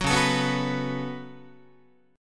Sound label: C sharp 7th Suspended 4th Sharp 5th, Guitar; C#7sus4#5; strummed; JGuitar